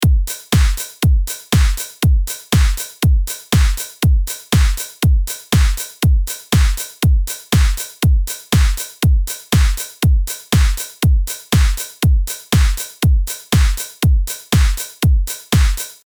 Four to the floor, kick drums

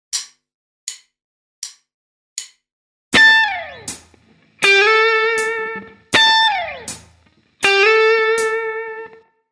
blues tricks, guitar; eight essential lead tricks

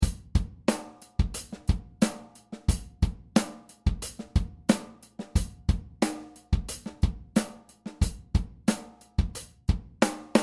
Snare variation and ghost notes

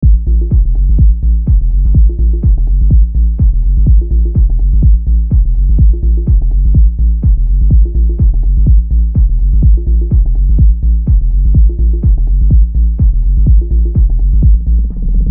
Loop with output filter

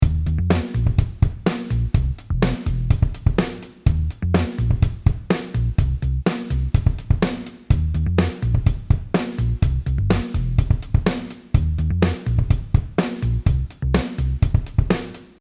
Closed tip; DrBeat

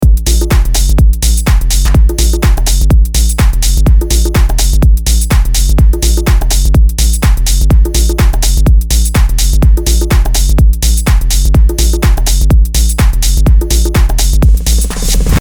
No layer bassline transposed

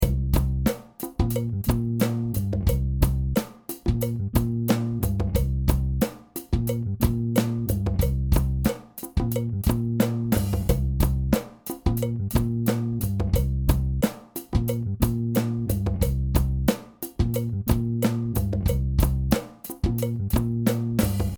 Raw drums

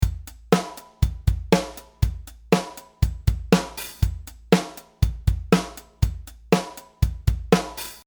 Basic groove, ghost notes

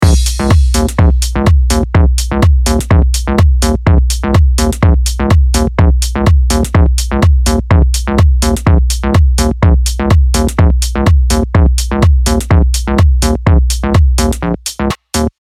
Low fundamental cut from bass to make space

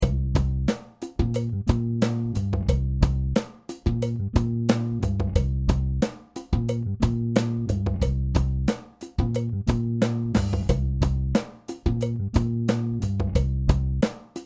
70% quantise

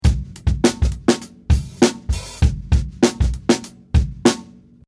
100BPM, funk loops

